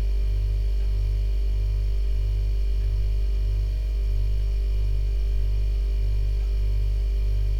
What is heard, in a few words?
hum; ambience; AssaultCube